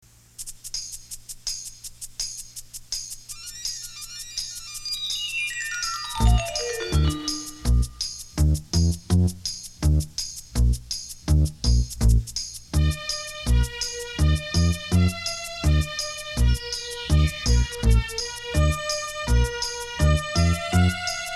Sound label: vocals